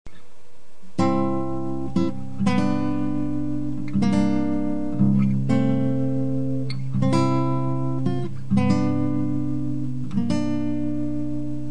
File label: acoustic lullaby